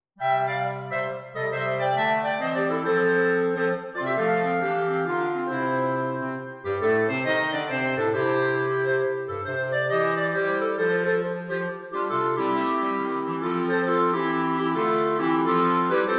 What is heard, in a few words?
clarinet, jazz carol for clarinet quartet; Good King Wenceslas in 3